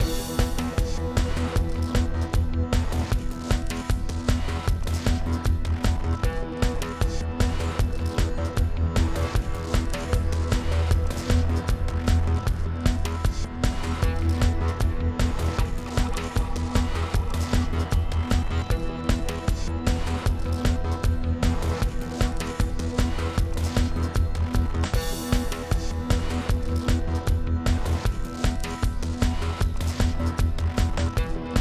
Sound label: Combat, 77BPM; Gridnodes TheView